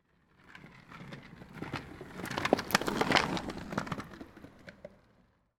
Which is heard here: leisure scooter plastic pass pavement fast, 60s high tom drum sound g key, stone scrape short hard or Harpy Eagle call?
leisure scooter plastic pass pavement fast